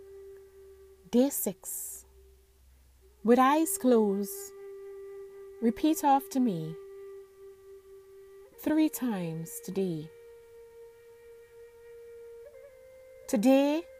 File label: healing mantra